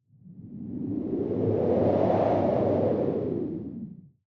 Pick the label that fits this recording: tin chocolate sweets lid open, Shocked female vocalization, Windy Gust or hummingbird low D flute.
Windy Gust